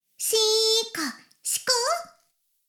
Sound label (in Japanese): シコシコ音声; 古き城のドラゴニート2